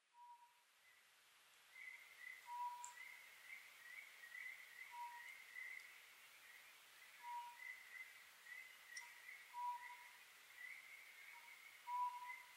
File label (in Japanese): konohazuku